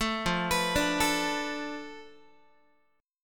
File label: F 6th Flat 5th F down Guitar, chord; F6b5 arpeggiated